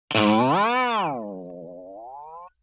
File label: Boing Box Short Hit; Vintage Cartoon; Hits & Tones